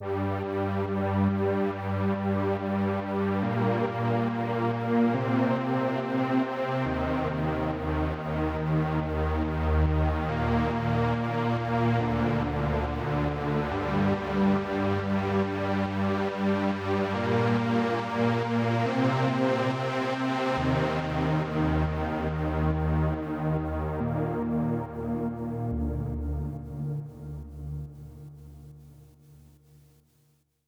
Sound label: Pad Main 140BPM, G minor; Unlooped; Vengeance Samples; VTS1 Devastating Truth Kit